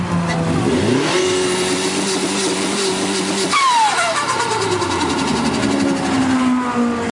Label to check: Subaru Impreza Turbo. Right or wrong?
Right